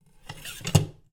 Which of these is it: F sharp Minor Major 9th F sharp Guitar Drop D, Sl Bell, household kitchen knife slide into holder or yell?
household kitchen knife slide into holder